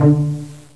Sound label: CELLO D; INSTRMNT